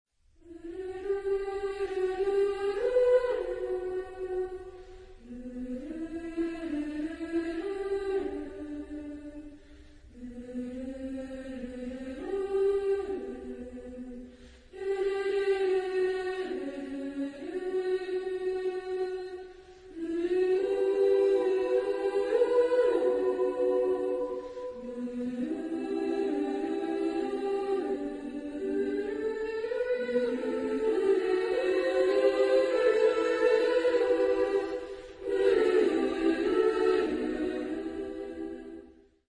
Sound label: choir